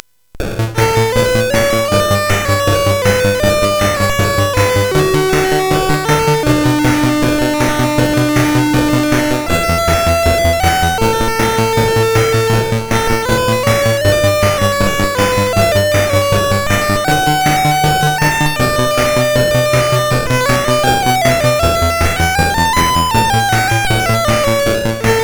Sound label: Synth Sample III, CSG8580R5; hvsc